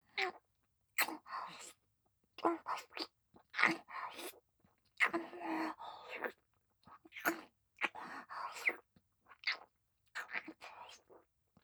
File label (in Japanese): フェラ